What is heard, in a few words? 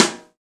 PRC, Rap FM Perc, Roland; Rappin Perc